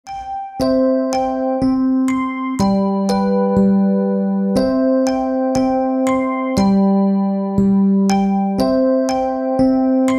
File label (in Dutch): langzaam